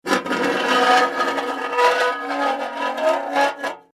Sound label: Impact Metal Scrape Long; Materials; SFX Bible 6